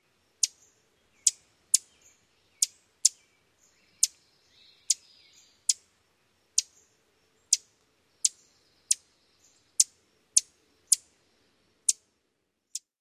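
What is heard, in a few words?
chewk calls, Ovenbird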